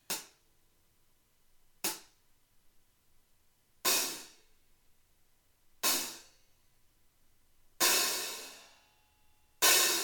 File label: Antique Hihat; hihat bekkenset 13; Amedia